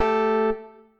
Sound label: countdown; tetrio